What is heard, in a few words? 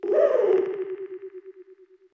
moan; gonner